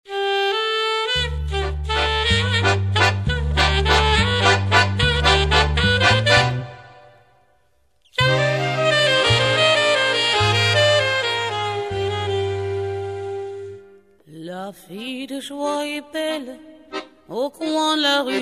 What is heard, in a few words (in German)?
akkordeoniste